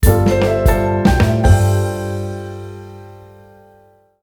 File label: Level Start; Ellen's Garden Restoration; Android